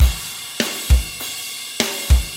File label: metal